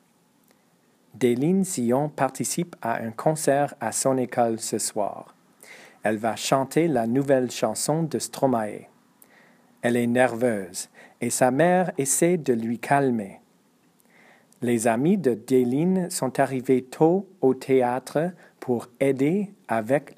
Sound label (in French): musique